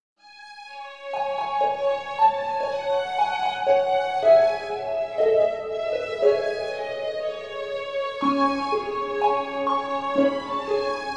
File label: Chill Wind